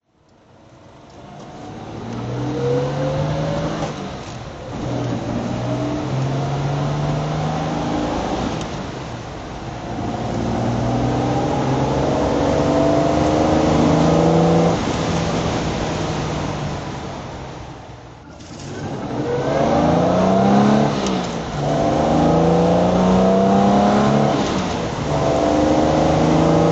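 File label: Jaguar E-type s15 42 ots drive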